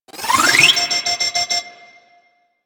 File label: Shield Lancer Pistol Alt Charge; Warframe; Lasrian; Lasrian Soldiers; Weapons